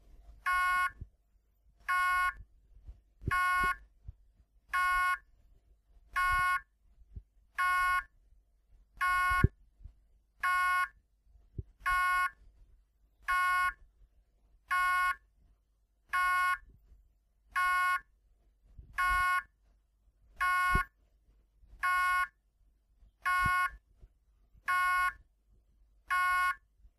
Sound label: harddrive beep